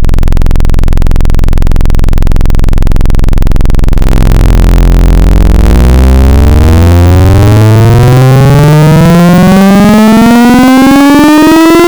Sync Square, Sub 37; Synthetic's Serum Collection; EvoSounds

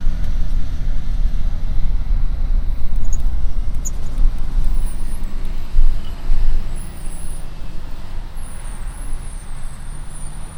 Bus Outside The Car Window, Rainy Day; Running Errands On A Rainy Day

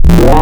Meow; Synthetic's Serum Collection; Cymatics - Morph Wavetables Vol 2

